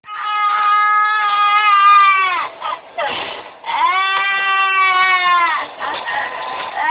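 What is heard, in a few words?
baby hungry, male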